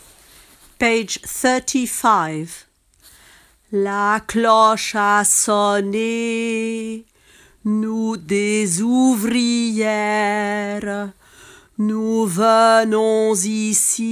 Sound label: tenor; Carmen